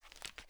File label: rem870 no pump end; weapons; garrysmod